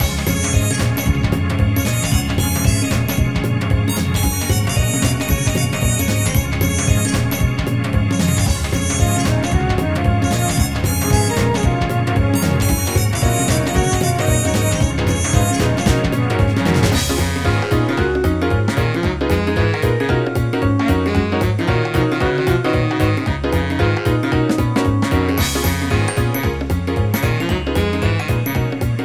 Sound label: boss victory